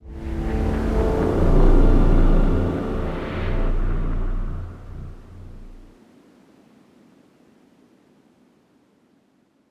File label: Action Street; The Amazing Spider-Man 2